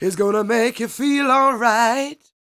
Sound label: SING, Vocal Planet Blues Soul BLUESY